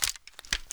m249 belt load; mg; garrysmod

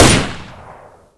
ots shoot, weapons; garrysmod

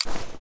mac10 reload start; garrysmod

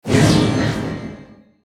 holy tele; cstrike; war3source; holyknight

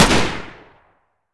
ak47 fire; garrysmod

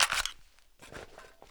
sr25 mag out, sniper; weapons; garrysmod

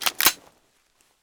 slide back, weapons; garrysmod

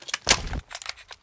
m4a1 clip out; garrysmod; m4a1 customizable

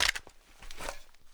famas mag out; garrysmod

